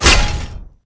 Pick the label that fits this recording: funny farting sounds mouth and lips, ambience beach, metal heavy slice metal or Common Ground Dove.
metal heavy slice metal